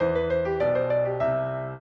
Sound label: trio, piano; Mozart